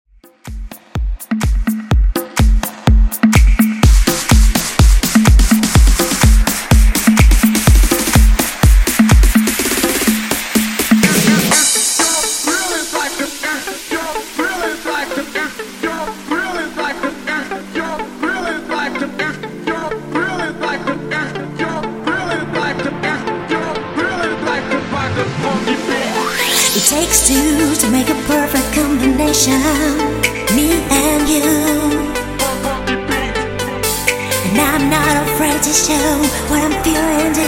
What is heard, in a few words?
Extended Funky House 125Bpm